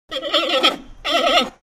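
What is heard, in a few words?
Panda Bleats Multiple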